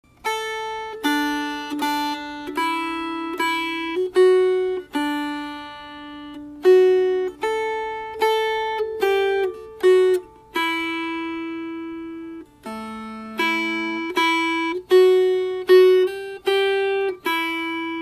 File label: Melody; Dreidel Song